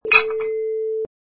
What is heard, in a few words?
Weight Put on Barbell